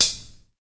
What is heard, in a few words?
old school soft sounding hip hop hat sample d key